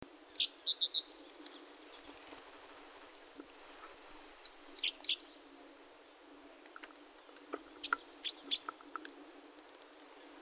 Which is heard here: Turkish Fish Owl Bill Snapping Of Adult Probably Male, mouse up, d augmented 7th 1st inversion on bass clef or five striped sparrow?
five striped sparrow